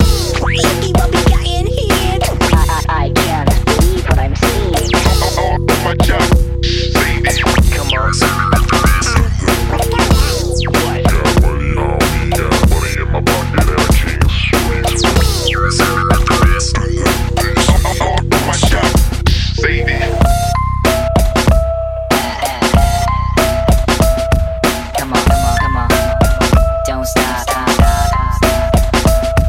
game over, music; gameplay; fridaynightfunkin; Pico

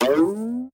bounce; vex6

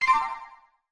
Shield button leave; crossyroad

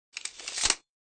Key; Swiss; bobtherobber2

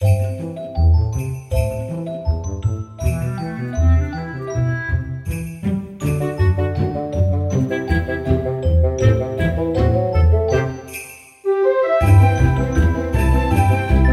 menu music; cuttherope-holiday

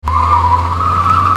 car speed off; crossyroad; dinosaurs; trex

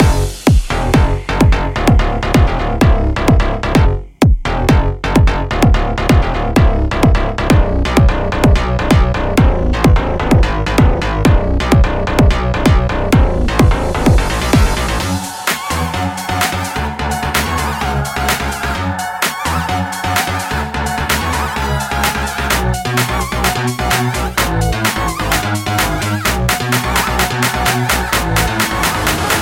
Inst; fridaynightfunkin

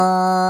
down; thirtydollarwebsite; fnf

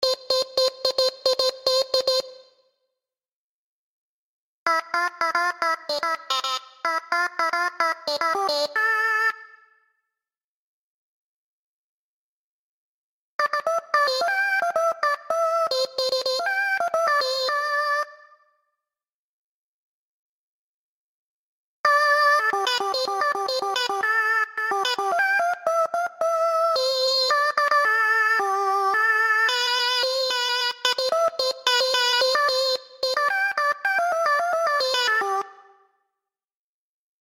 Voices mom; fridaynightfunkin

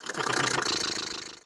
Bus; 60sburgerrun

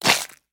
death; vex7

